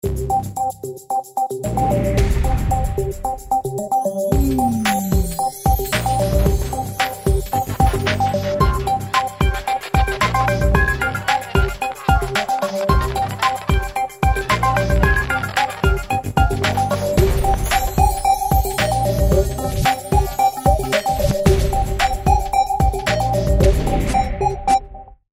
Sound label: GENERIC ELECTRO GREEN